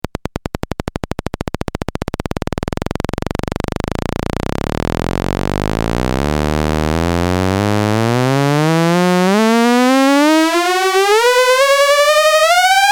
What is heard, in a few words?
Frequency Sweep